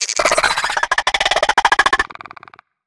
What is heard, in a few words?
Spider Trap Grab; Evolve; Gorgon